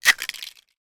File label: foley plastic thin small item kick skid pavement; zapsplat